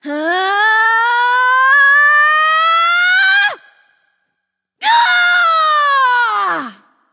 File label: battlecry; charybdis